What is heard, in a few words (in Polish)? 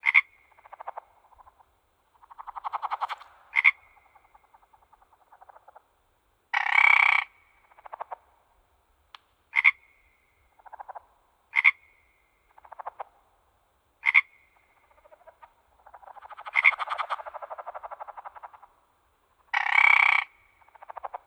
Odglosy zwierzat ZABA; Odgłosy zwierząt